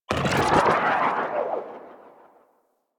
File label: Hair End; Warframe; MonkeyKing